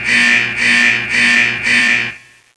capture tried

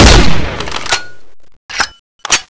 firecracker shoot

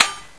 xm8 clipon

